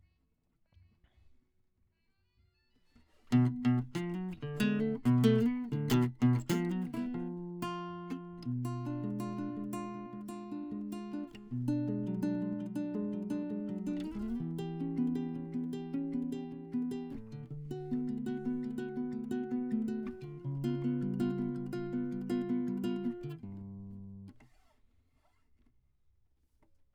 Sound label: Classic Guitar